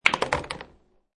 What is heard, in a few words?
Billiard Pool Snooker Ball Hits Other Ball Which Falls In Pocket; Lynne Music